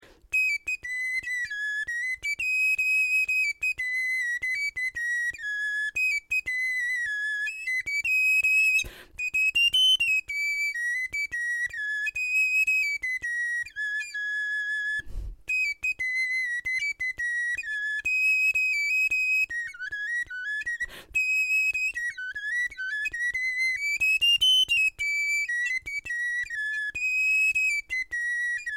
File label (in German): melodie, knochenfloeten